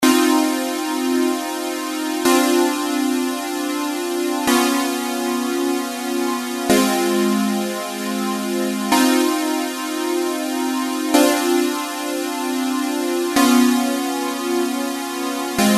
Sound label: gated synth